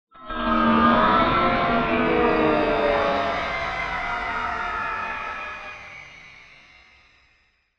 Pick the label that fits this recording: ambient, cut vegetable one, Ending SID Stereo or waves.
ambient